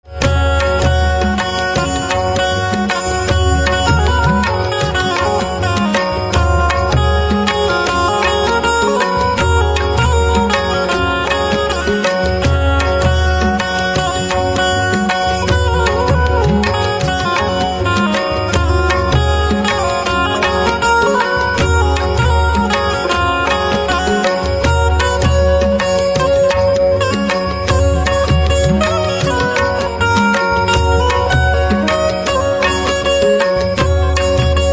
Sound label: Banjo